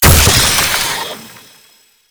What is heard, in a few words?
Void Heavy Scythe Crystal Embed; Warframe; Duviri; Incarnon Adapters; Melee